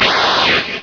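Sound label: fly kick; mk2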